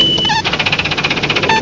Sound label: computer think; Super Games Pak (1991)(Odyssey)